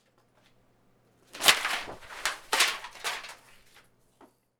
Paper Toss Office

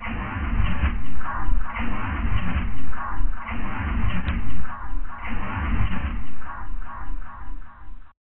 Tromping Sound Design

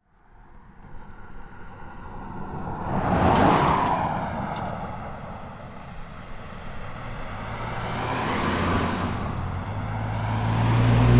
Cars Pass Vehicles Land

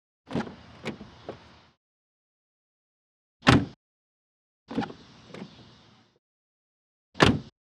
Car Door Shut Vehicles Land

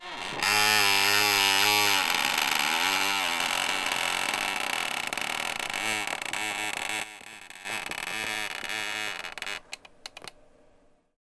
Computer Chair Grit Household

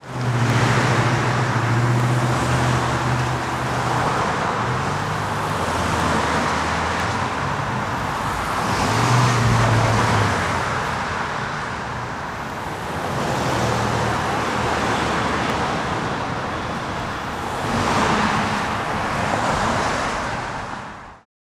Traffic, Vehicles Land

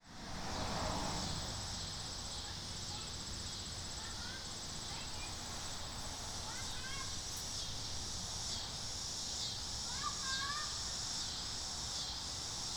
Summer Neighborhood, Outdoor Ambience

